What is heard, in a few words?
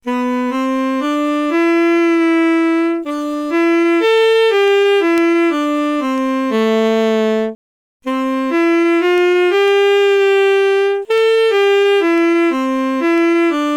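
sax song vibrato, saxophone(薩克斯風) 抖音合成; Csound pvoc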